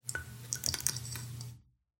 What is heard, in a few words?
light flicker, ambient lights